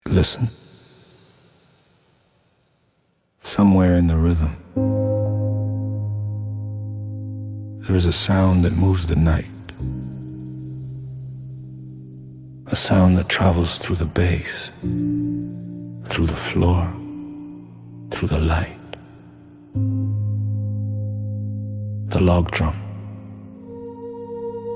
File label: The Log Drum Is Calling Amapiano Log Drum Dj Intro